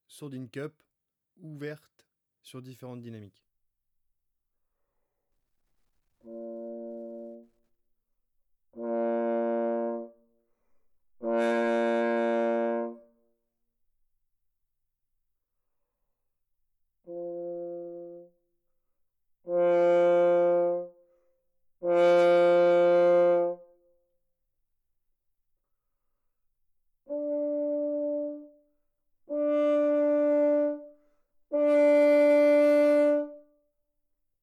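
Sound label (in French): sourdine cup ouverte sur différentes dynamiques, trombone; Sourdines; Sourdines fixees; traite orchestration; trombone tenor trombone basse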